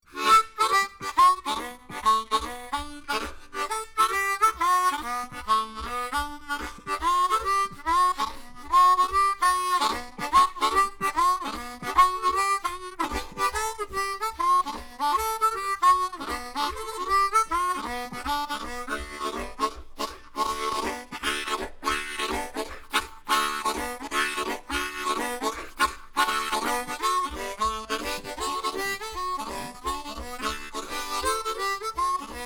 Hohner Blues Harp

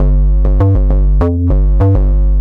synth bass; scoring pack 3

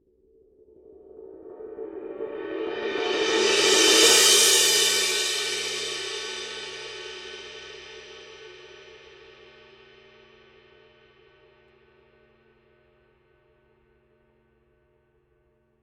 crash roll

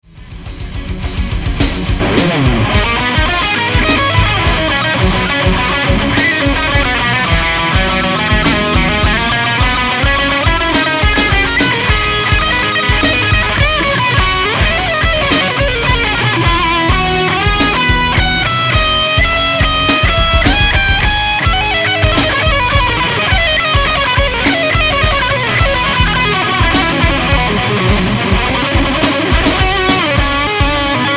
driver solo

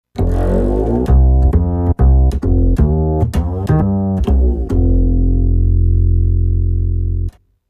Tyros Mega Ac Bass

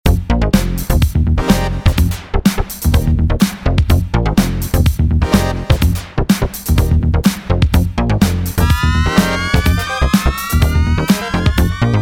Prophet P funk

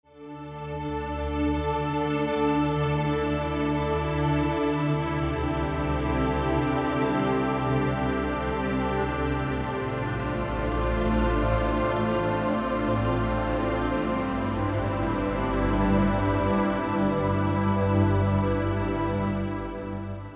Dry synth

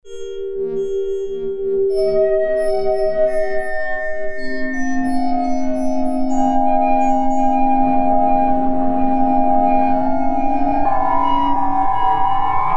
spacey

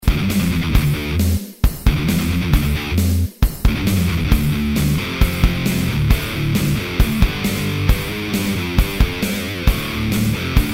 Recto

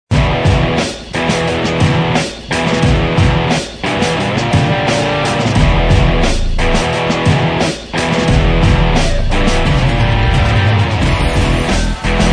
Smooth Lead Tones

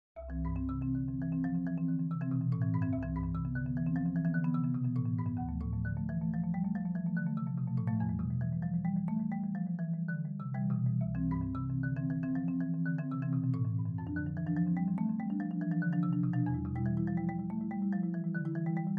MARIMBA VIBES

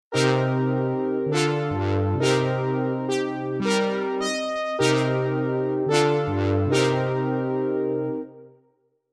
OB Brassy

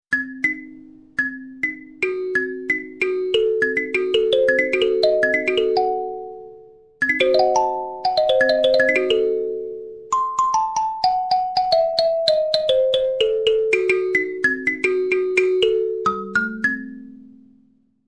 Kalimba Stereo Verb

